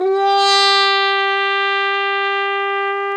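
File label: Plunger Mute, Brass; Roland L-CD702; Plunger & Wa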